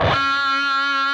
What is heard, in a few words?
PRS PINCH, Guitars